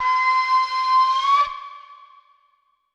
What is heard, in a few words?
flute, ethno, 160, C